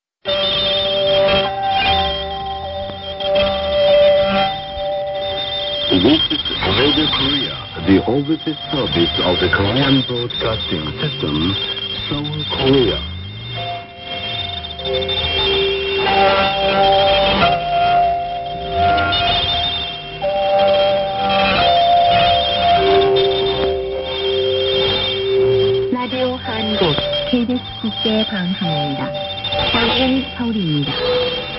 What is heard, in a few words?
interval signal; 韩国广播电台英语广播开台; radio korea